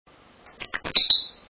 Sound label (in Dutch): uitroep, agaporniden; m 1.5 jr